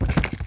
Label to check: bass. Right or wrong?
Wrong